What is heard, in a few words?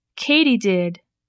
katydid